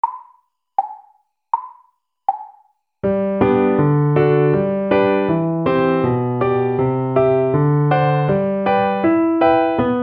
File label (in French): Sol Majeur schnell